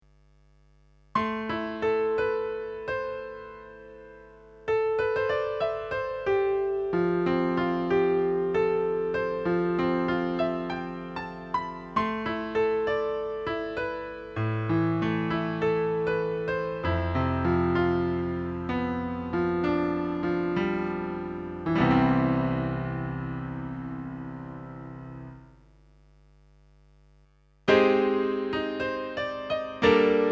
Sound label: Pianos